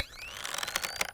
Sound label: draw bow, weapons